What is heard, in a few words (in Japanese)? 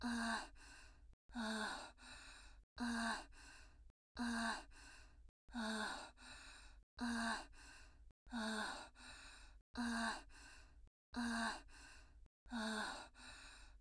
吐息